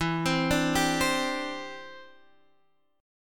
E Minor 7th Sharp 5th, down, Guitar, Half Step Down